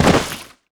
dirt land

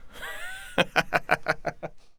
taunt hehe

